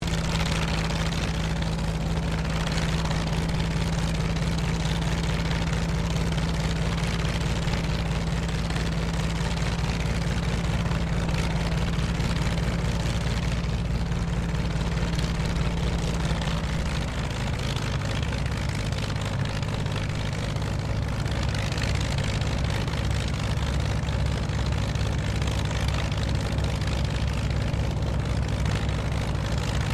AIRCRAFT CT4A IDLE STEREO, RAAF CT4 training aircraft; Airplane; Vehicles